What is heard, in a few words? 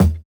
Kick, jungle breaks drum sounds